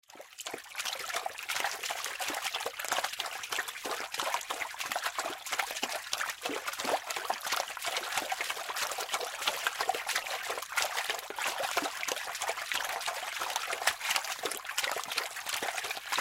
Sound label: Dog Doggy Paddle Water Swim; Animal; Dog Sound Effects